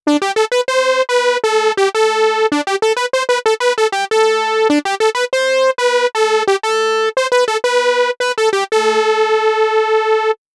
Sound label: mopho 2 voices detune; davesmith mopho